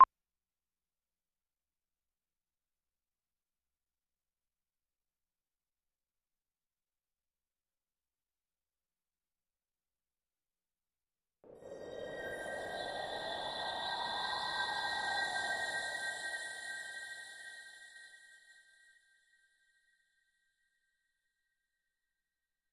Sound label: Effects Pads